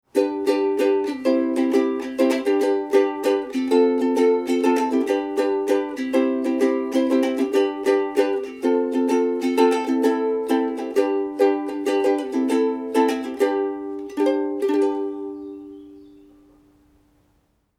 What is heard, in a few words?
Harley-Benton UK-11-NT-RW Strumming